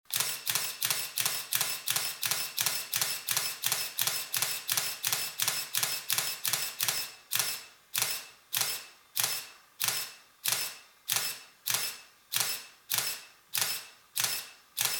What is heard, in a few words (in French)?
DECLENCHEMENT 350D D70 300D